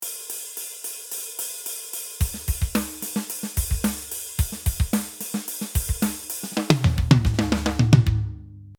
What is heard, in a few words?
HH Open